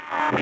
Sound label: Grid Fragment E 140 BPM, Psytrance